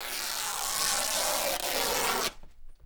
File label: RIP, Canvas Bag Rips